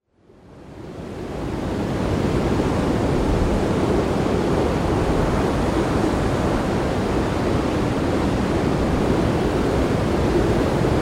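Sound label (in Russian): звук очень сильного ветра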